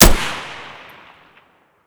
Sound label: ak74 raw close; Escape from Tarkov